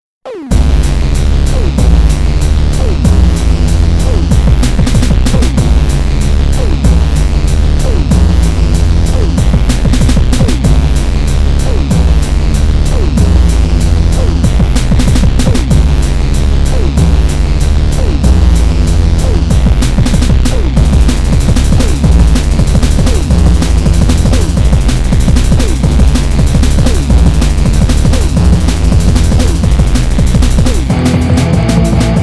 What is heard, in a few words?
d beat jungle